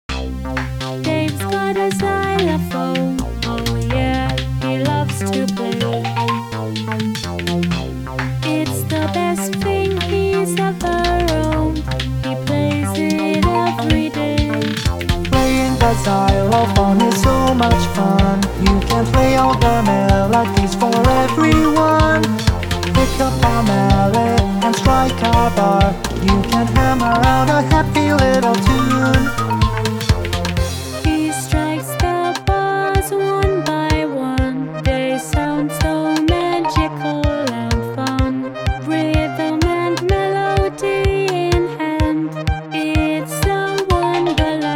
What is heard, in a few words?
Xylophone; Turbulent